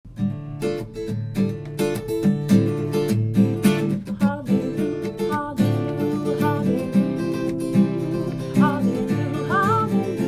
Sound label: Halleluyah Sufi Chant; Pg 100 Psalm 150 – Sufi Chant (melody)